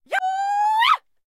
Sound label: laugh; irish pot luck